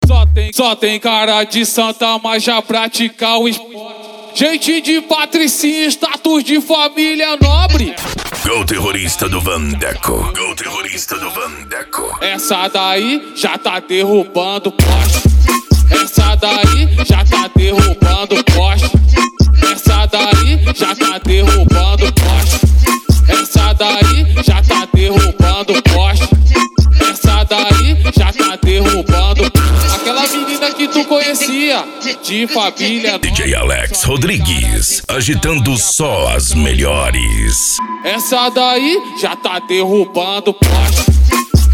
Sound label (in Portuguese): dance e funk mega bass